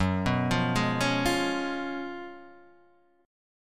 Gb Major 7th Suspended 2nd Suspended 4th, Guitar Standard; GbM7sus2sus4 arpeggiated; JGuitar